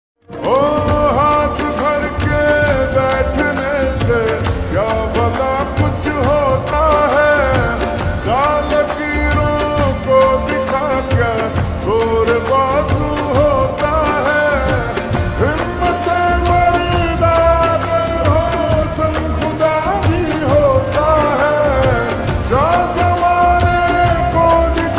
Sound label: Ringtone slowed reverb